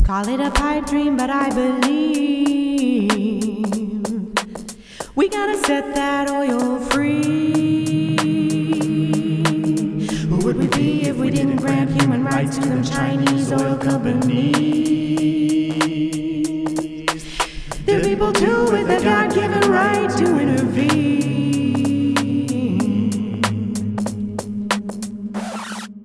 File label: ringtone